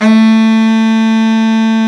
BRA BARI, Bariton Sax

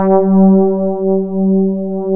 KBRD C5, ELEC.PNOS; East West; Global Sounds

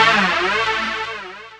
DR. LOOP, BUZZ; X-Static Goldmine 3

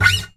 Gtr Scrape; Mo' Phatt; Digital Sound Factory Mo' Phatt

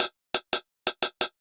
DRMSND, Pure Electro, BEATKIT

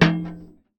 Percussive Hit, contact mic

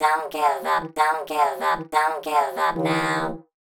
Vox Glitches; Sonic Foundry (Sony Creative Software); Underground UK House & Electro

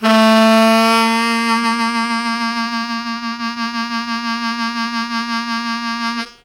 Strong Vib w Scoop Bb0, Harmonica; Zero G

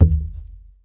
Foley Tub Kick; essential drumkit

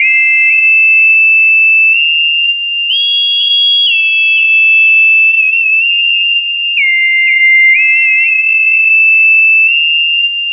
Lead, Synth, Leads; Sonic Foundry (Sony Creative Software); Electrocution

